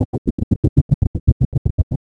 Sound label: engine, ships; Quantum Redshift; XBOX; tara